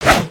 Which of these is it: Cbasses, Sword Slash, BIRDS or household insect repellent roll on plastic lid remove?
Sword Slash